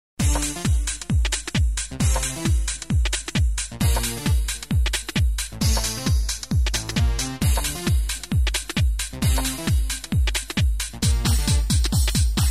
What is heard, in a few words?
SALSA UP